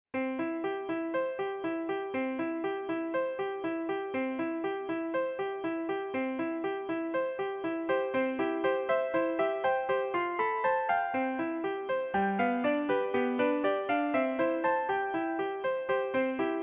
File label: musicbox